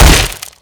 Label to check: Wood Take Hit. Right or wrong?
Right